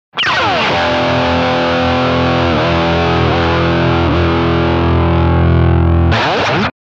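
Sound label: Egtr scrape, electric guitar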